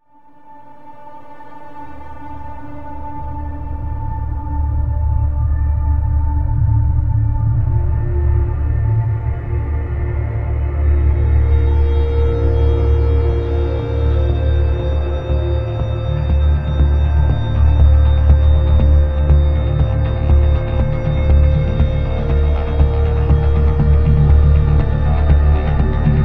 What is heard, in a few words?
electro